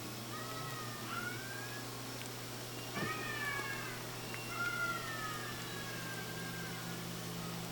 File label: up down calls, KW; Biggs